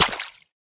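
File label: small object plopping in river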